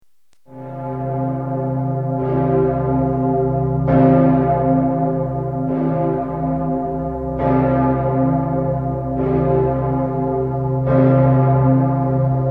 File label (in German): Vigil – Glocken; Domkirche St. Stephan Wien, Österreich